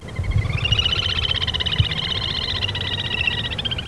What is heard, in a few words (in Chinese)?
緋秧雞, Zapornia fusca; 小鸊鷉; Teruo Furushima, Wild Bird